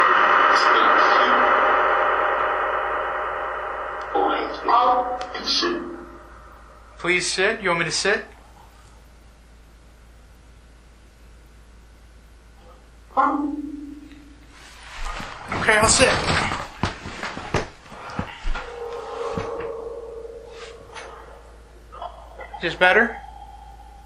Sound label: Phasma Box; Canopy Room